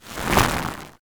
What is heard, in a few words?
fighting game source whoosh synthesized fuzz motion arcade; 8 bit